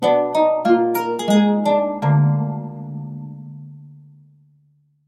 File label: jingle